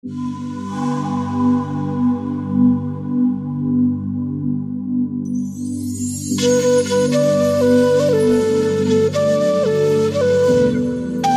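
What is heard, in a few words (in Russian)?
melodiya mechtaniy na fleite, dream melody on the flute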